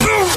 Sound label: "boo"; ooh ahh